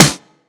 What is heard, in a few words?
anerer, snares real